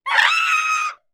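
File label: horror scream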